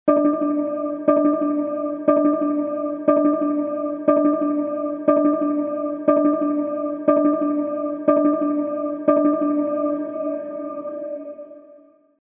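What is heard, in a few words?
sound of waiting for correct answer in game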